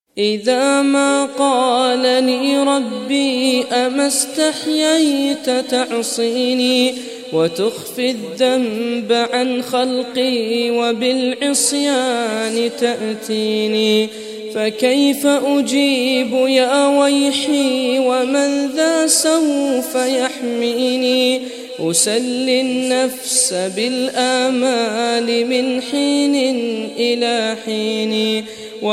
Arabic Song

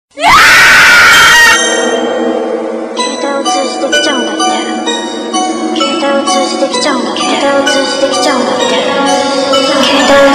Scariest Scream

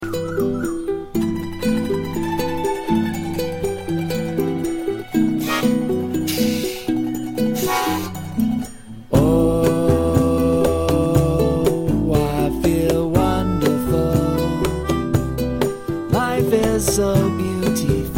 New Vodafone Tone

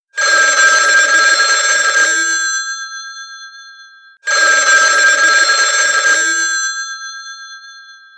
Dring Ring

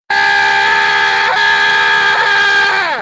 Devil Scream

